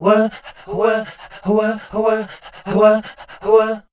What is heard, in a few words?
SCAT